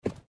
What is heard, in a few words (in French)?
cheval